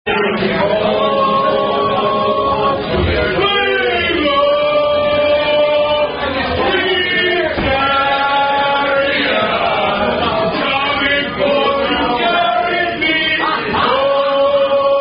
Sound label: Rugby Song, Swing Low Sweet Chariot